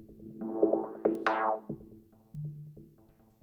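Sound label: PERCUSSION ELECTRO 140, Techno Drum; Techno2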